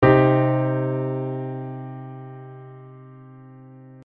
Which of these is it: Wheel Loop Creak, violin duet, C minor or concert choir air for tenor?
C minor